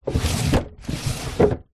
household drawer open close bedside cabinet wooden